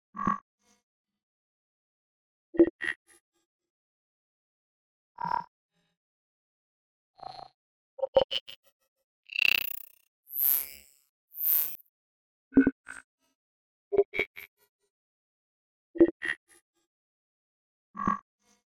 dark ui glitch dark; The Sound Keeper; Dark Sci-Fi UI Sounds

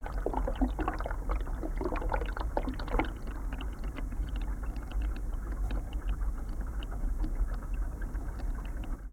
Drain, Submerge; InMotionAudio

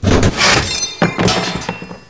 tank shell casing; hl2

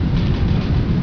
wall bit, ambient; hl2; kraken

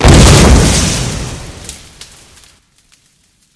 impact, wall; hl2; combine wall

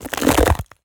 ice crack break; Ice Cracking; BluezoneCorp

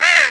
pain, crow; hl2

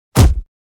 Hit; NoizBoy; Hong Kong Action Kit - Hit Kit #2

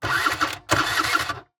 Scooter False-Start, Piaggio Sfera 50cc; SoundBits; Scooter Sfx; ’94 Piaggio Sfera 50cc

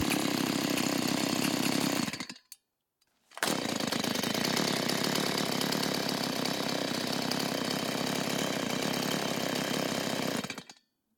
chainsaw gas small engine on and off mono; SoundHolder

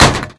bounce, slam; weapons

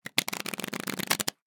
Riffeling Playing Cards on Cardboard; Board Games; CB Sound Design; Gamedesigners Audio Toolkit 01

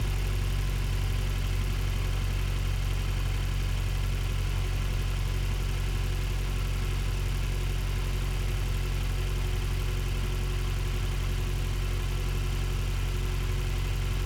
Nissan Qashqai I 1.6 Engine - 1k rpm loop; mono; Soundholder

